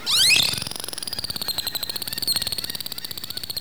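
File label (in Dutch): tuimelaar whistle; walvisstrandingen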